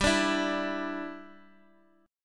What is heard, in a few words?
G 5th 6th G; G56 Chord {X X 5 7 5 X} strummed